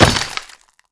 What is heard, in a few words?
Crate Break